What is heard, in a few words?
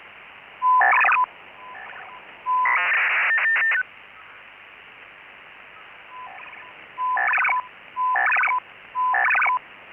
CHN 8FSK; Chinese MIL hybrid 2400 Bd BPSK modem with short ACK burst and related 8-FSK control sequences; PSK-systems